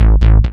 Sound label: dance, bass; Techno Trance; Techno Trance Essentials